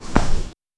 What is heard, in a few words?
player walk